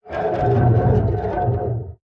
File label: damage vehicle; World Of Warships